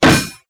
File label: bomb hit; Original TK Sounds